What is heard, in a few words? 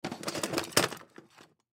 Box Junk Set Down, Box Junk Set Down Rattle; Rustling; Foley